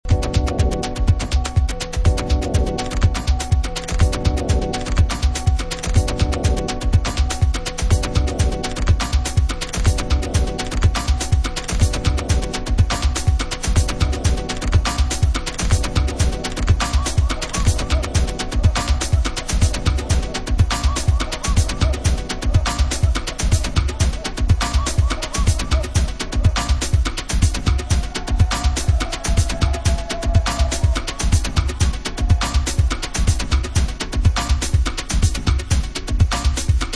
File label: male kicks